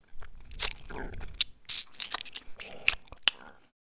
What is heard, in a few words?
Gross Wet Eating Noises